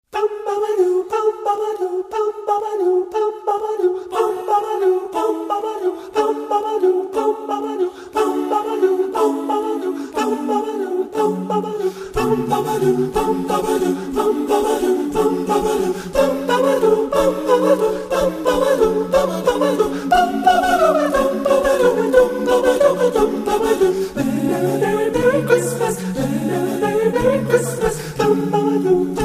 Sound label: Acapella; Christmas